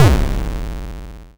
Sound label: YM Kick, DRUMS